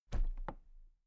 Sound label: toolbox place upright soft